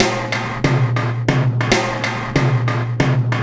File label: Ripple Perc; Sonic Foundry (Sony Creative Software); Processed Drumkits Zero Gravity Beats